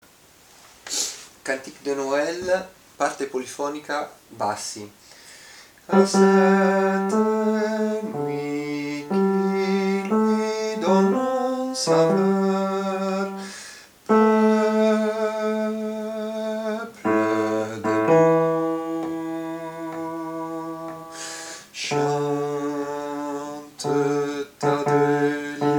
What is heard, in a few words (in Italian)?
bassi; Cantique Adam